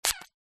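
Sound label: household perfume single pump spray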